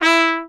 Trumpet staccato E3; Orchestral; DirectWave; Varazdin Orchestral

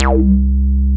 BASS MONO

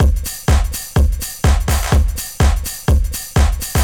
DEEPHOUSE BEATS 125

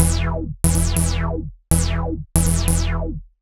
ELECTRO BASS 140 A

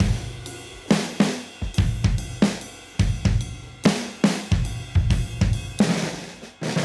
Modern Breaks; Touch Loops; ClassicBreakSessions

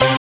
dance chord

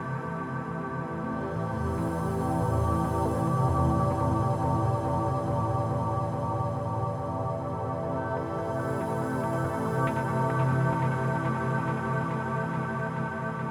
techno pad 140; Techno2; Keys

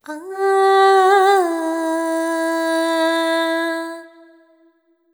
ETHNO FEMALE, Vocals; ethno2

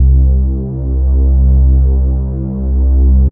warm pad; Harmor; Generators

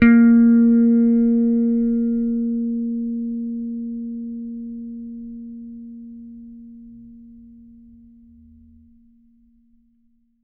T-BIRD BASS PICK A#3; Bass Mace; DirectWave

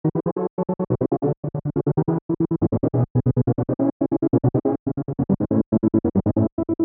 140 A Seq; Touch Loops; DigitalSpectrumsMini

